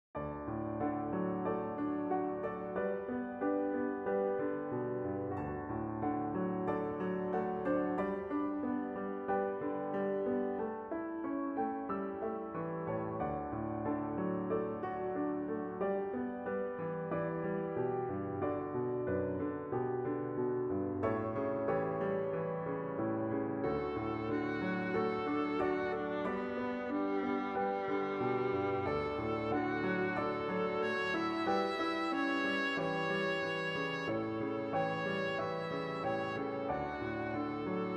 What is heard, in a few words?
Easy Viola